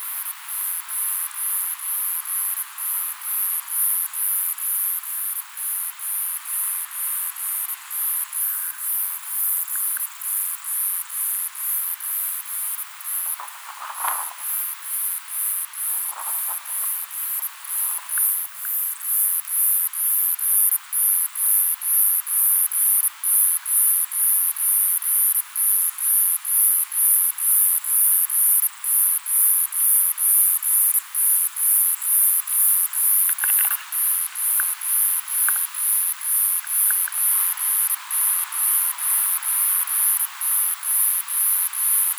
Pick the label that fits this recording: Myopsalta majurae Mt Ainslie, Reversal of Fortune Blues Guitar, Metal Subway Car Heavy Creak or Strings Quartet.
Myopsalta majurae Mt Ainslie